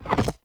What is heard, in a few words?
Fire Rain Ground Rupture; Powers; Fenn